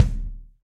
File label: Kick Felt Session Dry; Drums; Drum Hits; Standard Hits; Ableton; Session Drums Studio